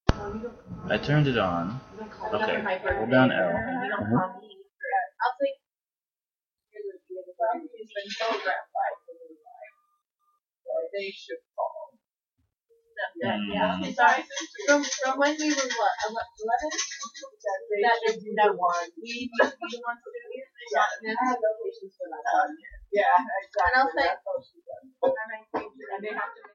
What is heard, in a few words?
sleep; weeklybeats-2016